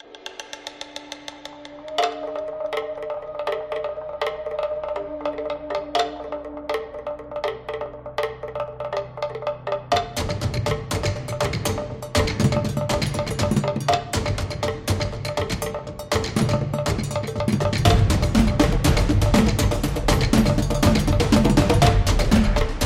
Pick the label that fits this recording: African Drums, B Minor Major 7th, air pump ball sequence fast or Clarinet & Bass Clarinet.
African Drums